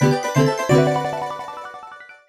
Jingle Note Received; Swapnote

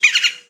woodpecker; CS Level2

